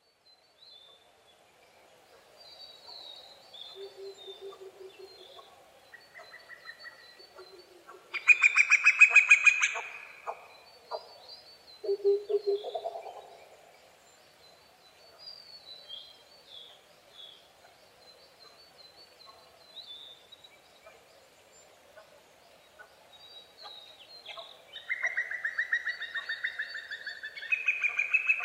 tutudori

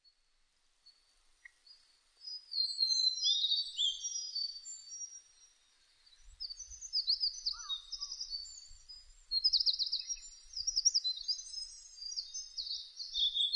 ooruri

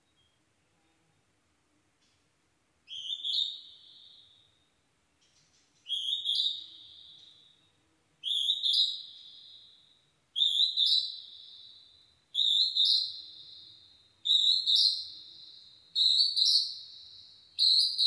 jyuuiti

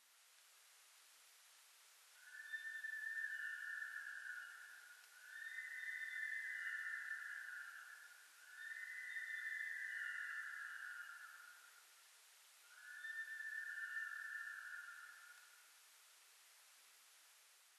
nihonjika

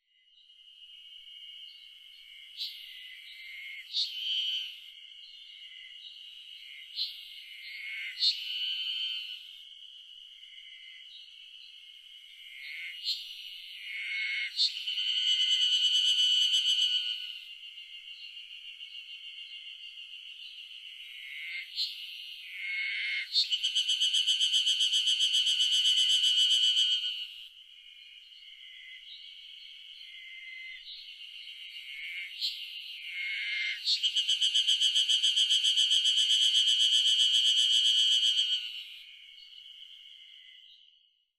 ezoharuzemi

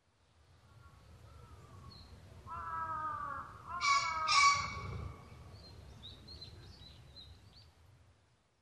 kiji

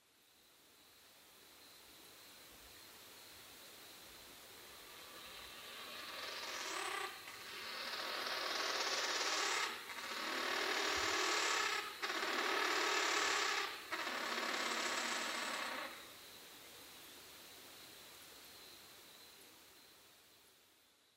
musasabi

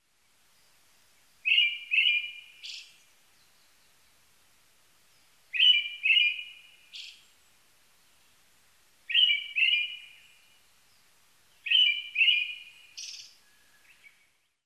akahara

